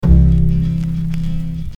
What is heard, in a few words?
BASS